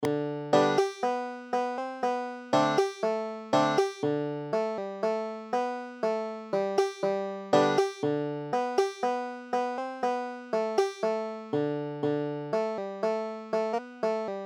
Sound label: banjo